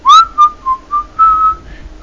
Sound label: whistle work